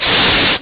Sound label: 1 syllable hiss; sylvester the cat